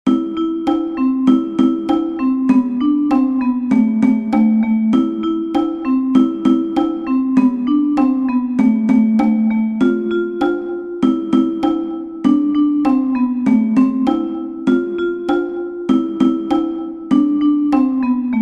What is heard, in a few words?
Cajon; Sascha liebt nicht grosse Worte